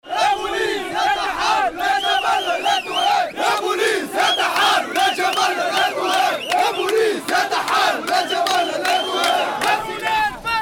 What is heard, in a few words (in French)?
Manif Paysanne Manifestation